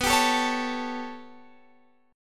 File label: B 7th Suspended 2nd Sharp 5th down Guitar Half Step Down, chord; B7sus2#5